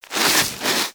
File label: Armadillo Roll Snow; Warframe; Characters; Hunting Critters